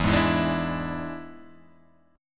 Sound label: E 7th E down